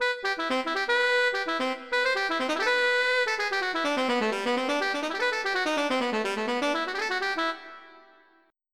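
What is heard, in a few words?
tenor sax; Roland - An Invitation To The Roland World Of Music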